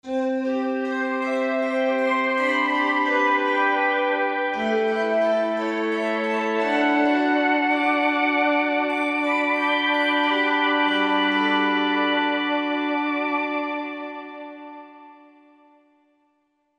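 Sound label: Soprano Sax, Korg MSC-11S Brass; SopianoSax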